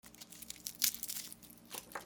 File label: KITCHEN